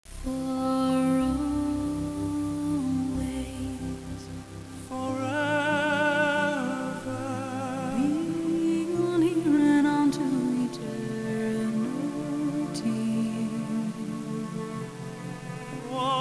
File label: duet hard